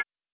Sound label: hit wall; pou